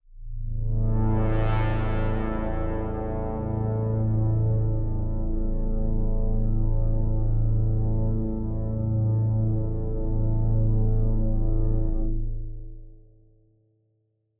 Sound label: One Shot Drone A, dystopian drone; One-Shot Drones